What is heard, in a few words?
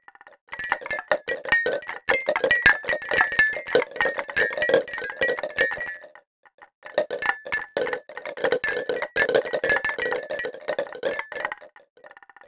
neurons; ICAD2002